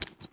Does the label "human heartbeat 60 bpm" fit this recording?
No